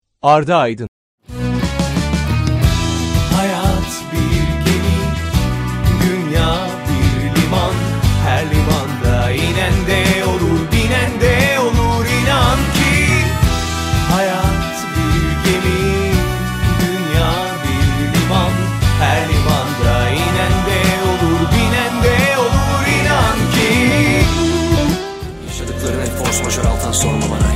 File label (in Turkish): vokal